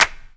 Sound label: CLAP, Dance Drums